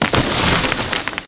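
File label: rock crush; outlaws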